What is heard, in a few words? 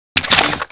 reload start; chicken fortress 2